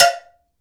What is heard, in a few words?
cowbell; AKAI S6000 CD-ROM - Volume 5